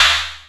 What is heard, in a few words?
Dry Snar, dry snares; Quasimidi Rave-o-lution 309